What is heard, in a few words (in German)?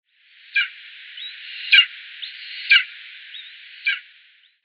Habichtsadler Hieraaetus fasciatus; Vogelstimmen